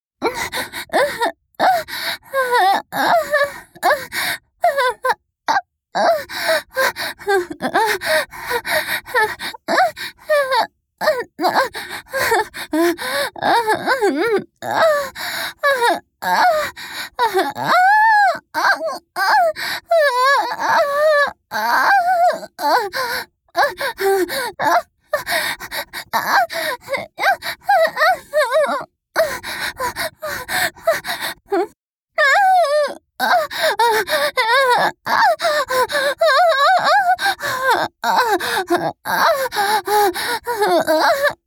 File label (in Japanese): 相手に突かれる; 実用ボイス抜粋集